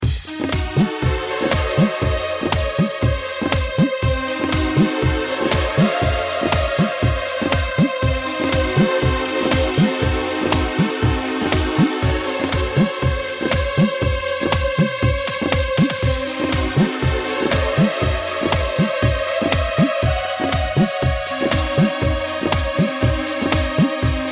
Indian 80s

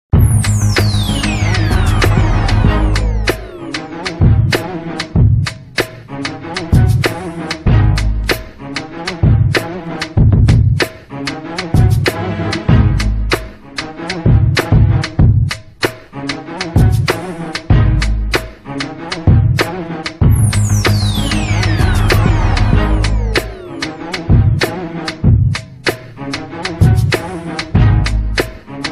Hip Hop

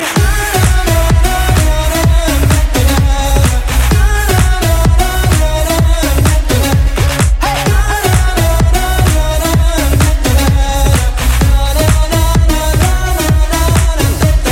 Electronic Symphony

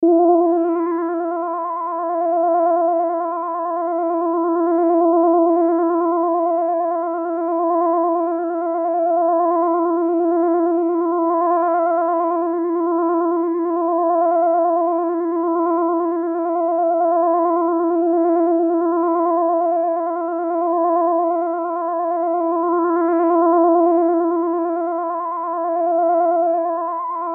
Alien Alert

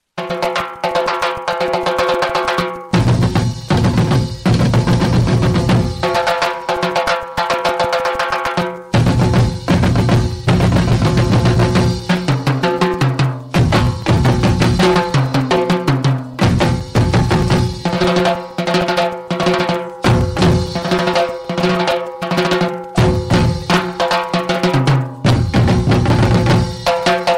Tribal

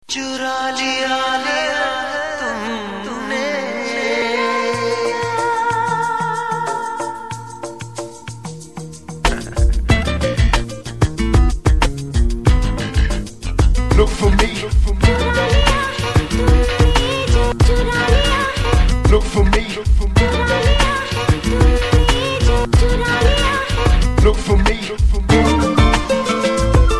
Hindi Mix

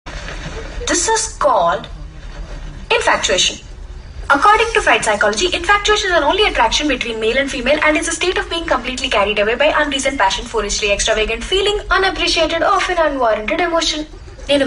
Female

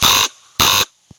Raven Call

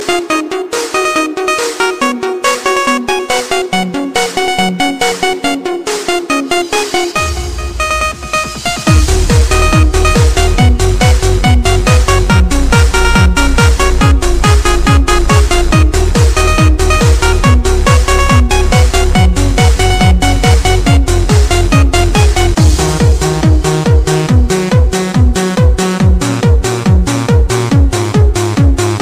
Best Techno

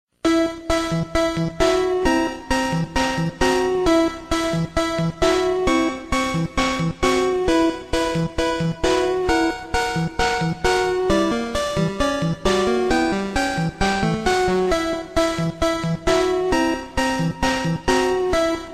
Forest; Pokemon RBY

